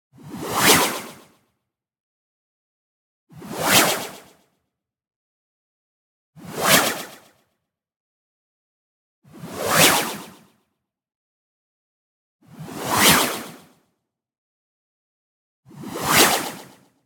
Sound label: whoosh, fast wobble noise echo; boom library; Anime Essentials